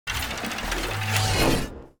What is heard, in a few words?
lose